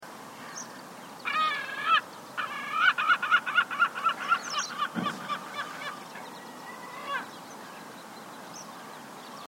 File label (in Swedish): armenisk trut